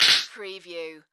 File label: Multimedia Button; sound-effects-bible